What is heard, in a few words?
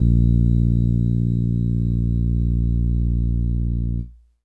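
E Acoustic DX100 A#0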